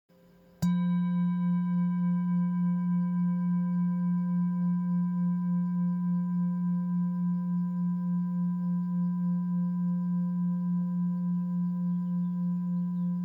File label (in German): Langer klang